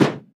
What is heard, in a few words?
Dofs Tak, Percussion; Roland BK-5